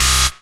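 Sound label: Rave Synth, Dance Mega Jungle Rave; Best Service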